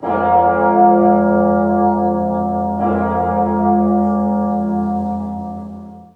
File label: Drama Bells; Heroine Anthem Zero 2 Scars of Memories